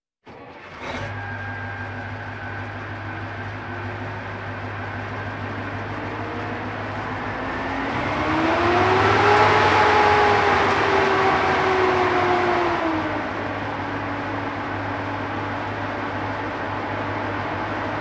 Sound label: Military vehicles